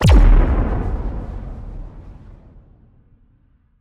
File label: Implode